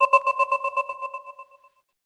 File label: Radar